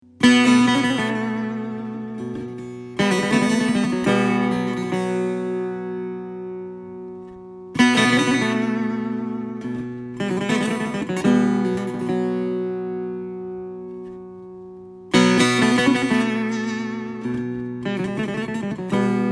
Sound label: Taknavaziye Setar